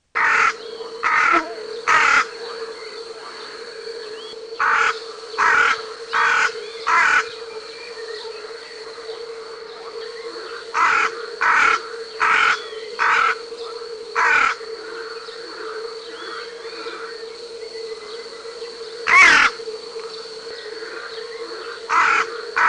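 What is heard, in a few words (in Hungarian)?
Dolmányos varjú; Madarak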